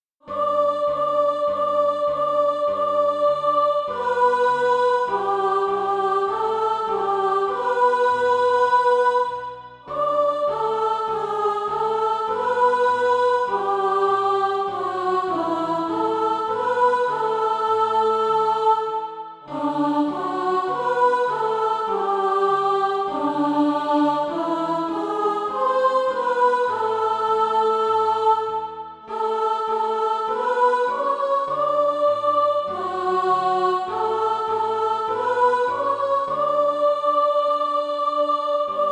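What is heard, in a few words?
soprano, Practise Music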